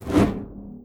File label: attack fire